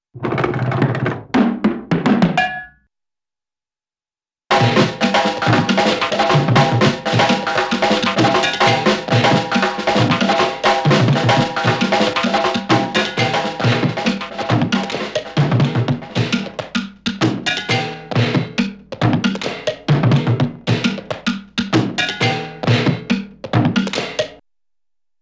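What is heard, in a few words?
Objects Roll & Fall with drum Hit - Funny Drum & Pan Hits, cartoon; Hollywood Edge; Cartoon Trax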